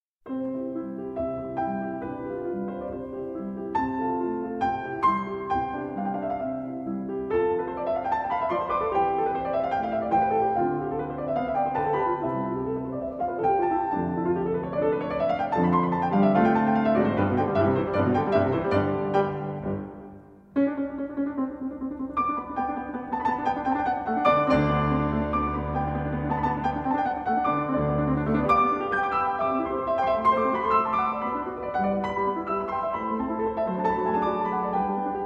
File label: Piano Sonata in C Major, 2 Pianos; No. 16; K. 545 For Beginners, EG 113c; Arr. For 2 Pianos By Edvard Grieg